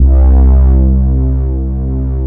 MR BRASS C2, BRASSY, Synth

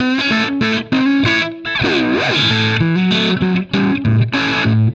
BLUES GUITAR

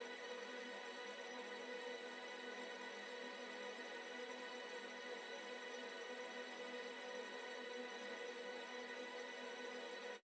pad; M8

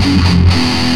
Riff (lick), Industrial, Nasty

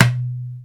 DUMBEK, ETHNIC PERC; InVision Interactive; Lightware VOL-1

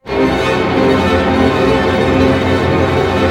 ARP C, STR ARP DIM; Sound On Sound - Peter Siedleczek's Orchestra

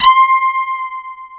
DS8 C 5, Korg; Back In Time Records; Korg Universe VOL-1

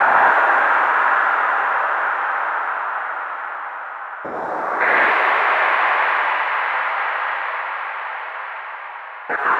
Distant Battle; Zero-G - Future Wave

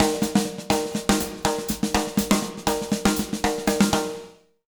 BEAT, Jazz Latin Drumloops; 120 BBEAT; USB Soundscan vol.08; AKAI

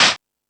Percussion, Drum Machines E-MU Pro-Cussion

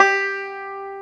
banjo G4; AKAI S-Series CD-ROM Sound Library VOL-2

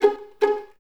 VS G#4 Pizz, String Ensembles, Violins; Miroslav Vitous; 11 Violins

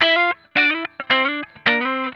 GLIDE, Funk Guitar; Zero G - Funk Guitar

